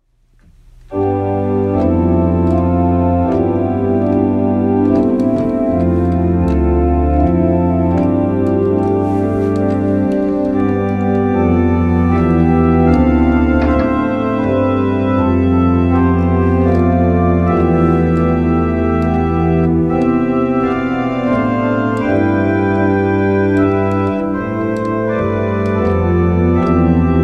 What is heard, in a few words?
Mixolydian Prelude; Peeters; Snowbeck